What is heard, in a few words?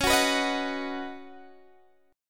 C sharp 9th Suspended 4th C sharp down Guitar Open D, chord